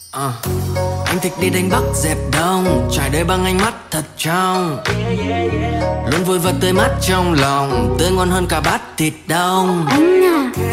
rap; Nhac Chuong; nhac tre

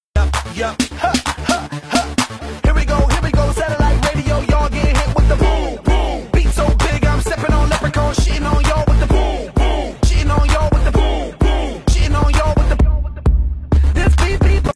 Remix Tiktok

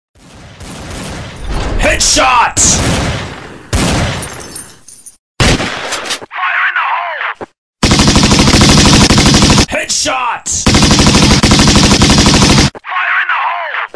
headshot; Nhac chuong; headshot game cf

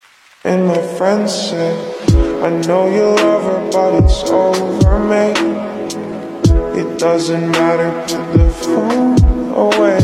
Be Alright Lofi

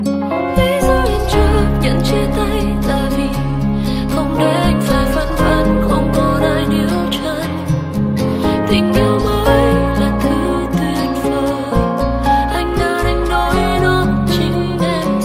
Lofi Ver

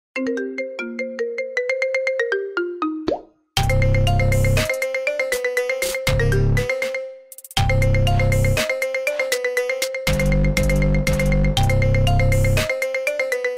Samsung Galaxy S22 The Banjo

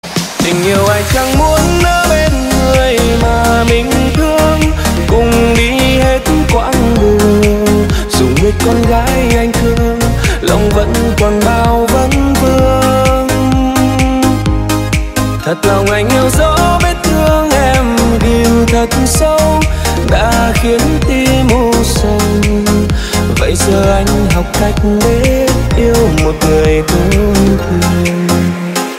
remix; nhac remix; nhac chuong

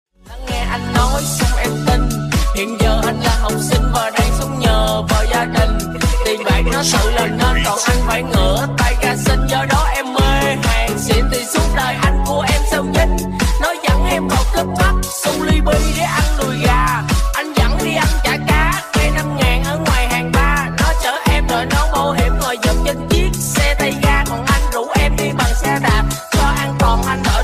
remix dj

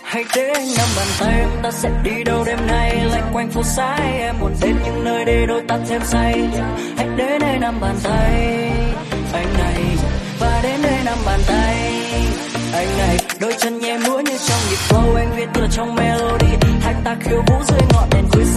Remix; nhac chuong

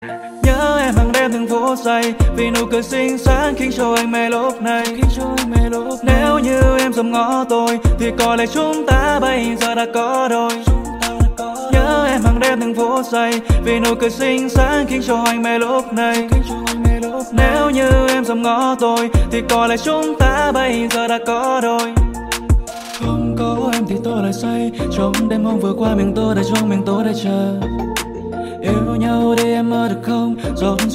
Chill Dream

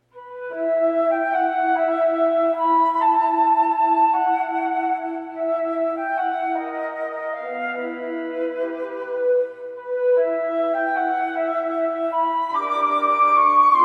iPhone 7 Ring Ring Ring; nhac chuong

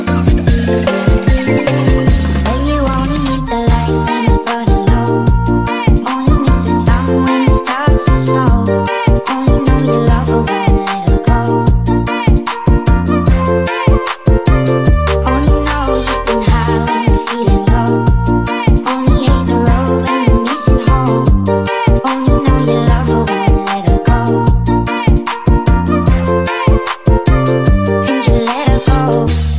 Remix

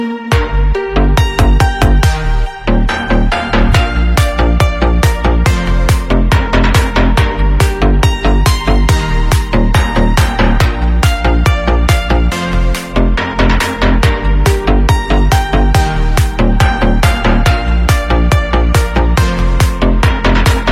remix edm hot tiktok

